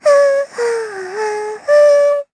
Hum; Lilia; kingsraid; jp

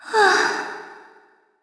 Vox Sigh, jp; voices; heroes; kingsraid

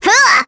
Vox Attack; Nia; voices heroes en; kingsraid

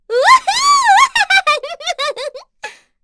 Vox Happy; kingsraid; voices heroes en

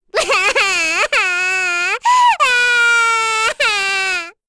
Vox Sad; Cecilia; kings raid; en

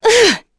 Attack, Vox; en; Shamilla; Kings Raid

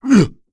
Vox Jump; Bernheim; kings raid; voices heroes en

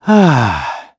Vox sigh; kingsraid; voices heroes en

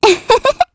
Laugh, kr; voices; heroes; Estelle; kingsraid

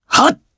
Jump, jp; Theo; kings raid

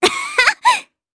Laugh, jp; voices heroes jp; Cecilia; kingsraid

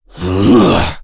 Attack, Vox; en; voices; heroes; KaselB; kings raid

